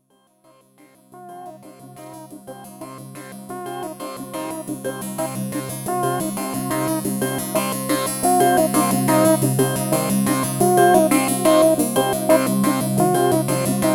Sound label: sh5 Mix